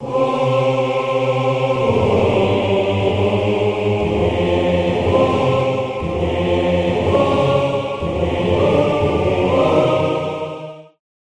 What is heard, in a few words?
Octave Aahs Choir; Magnus Choir; Syntheway